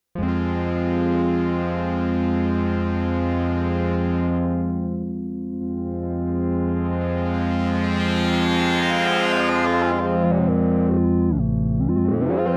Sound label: filter 24; parva